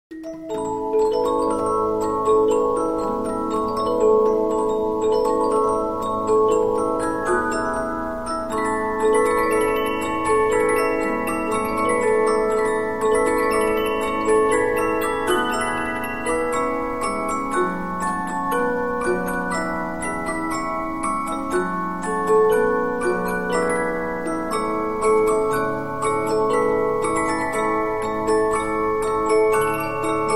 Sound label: Polyphon, 15inch disc musicbox